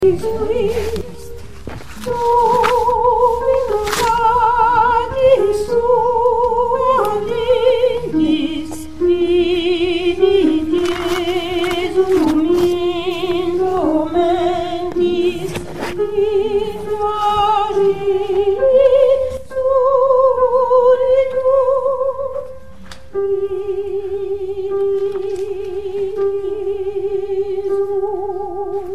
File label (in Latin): qui est soprani; Stabat Mater - Giovanni Battista Pergolesi; 5 Stabat la fin de qui est homo soprani